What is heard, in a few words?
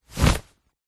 clothing sports shorts single flap movement kick; zapsplat